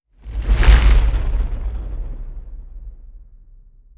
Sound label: Rock